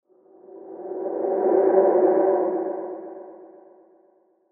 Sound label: ghostly approach